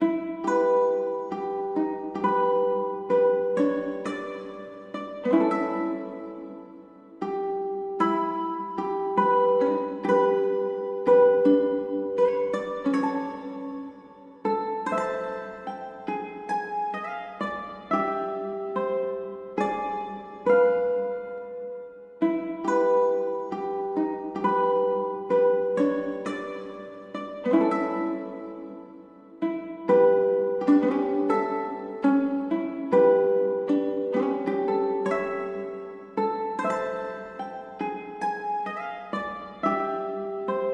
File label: Bard Lute; World of Warcraft; Battle for Azeroth